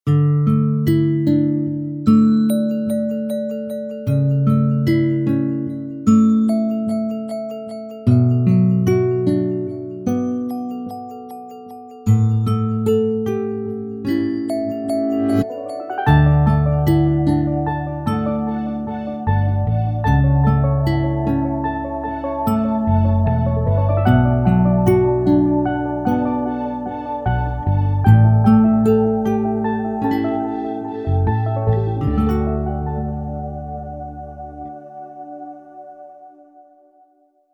Calm, alarms

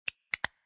side down, Keytone sounds, Music Scale; LG U970 Shine

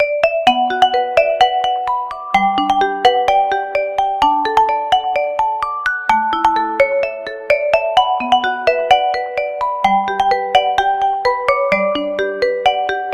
Go Beyond Marimba; Honor X9b 5G; ringtones

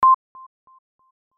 Headset; BenQ B502

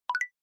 battery charge; Infinix Hot 12 Play

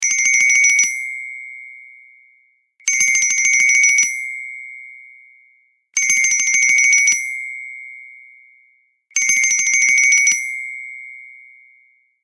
Xylophone; Tinno ATT Calypso 3; ringtones

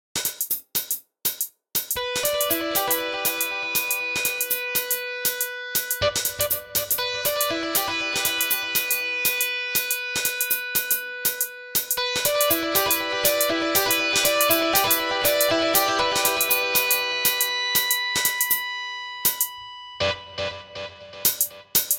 Distorted, alarms; LG P940 Prada 3.0

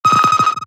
simmer, notifications; Nothing OS 1.5

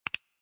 Pound, Keytone sounds; LG U970 Shine

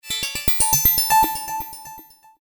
notification; Gionee; GN206

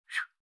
HAPTIC BALLOON

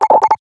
gargle, notifications; Nothing OS 1.5

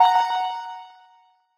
notifications; RED Hydrogen-One

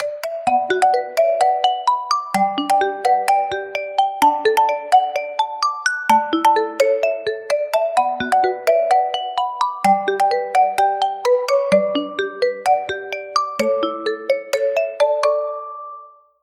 Marimba, ringtones; Honor 600-Pro-China

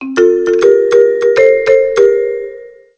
Alert; Samsung; SGH-i300

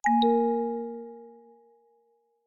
Harmonious; Infinix Note Edge; notifications

